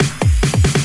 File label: Fill 140 BPM